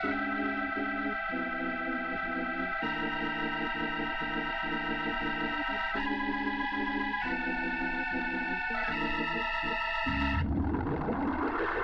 Organ

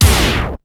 drum hit; SoundFactory; SMPMANIA; EFFECTS

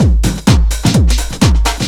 LOOP; SoundFactory; DRUMLOOP

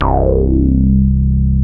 MOOG BASS; SoundFactory

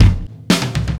Acoustic Fill, Drums

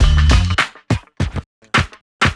Old School Break, Drums

